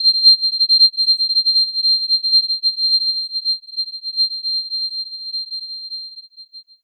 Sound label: Ear Ring